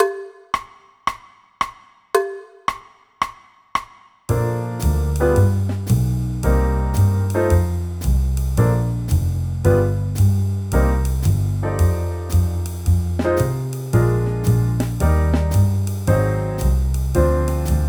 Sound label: Junior Jazz Trumpet Playalong